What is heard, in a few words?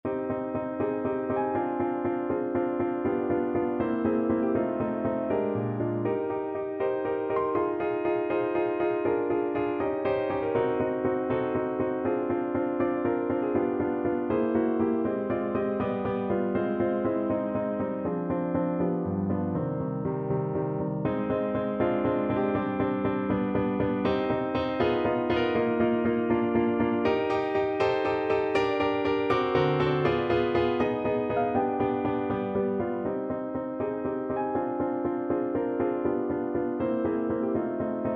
pachulski prelude, piano